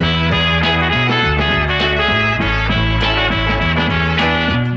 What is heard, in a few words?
horns, phonky loops